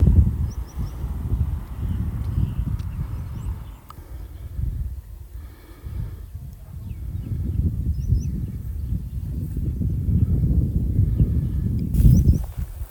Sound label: solitary sandpiper